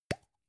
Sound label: gum plastic box open soft